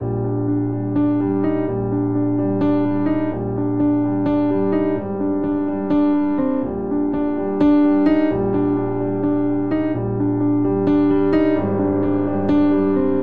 Ostinato Yearning 7/4 C Minor 127 bpm, Grand Piano; MIDI Clips; Ableton